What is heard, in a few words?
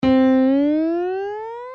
Slide note sample